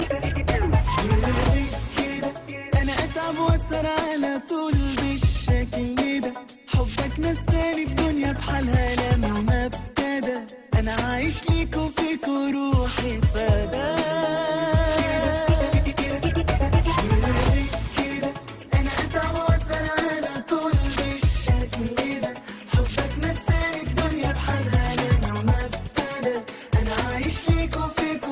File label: Arabic Tone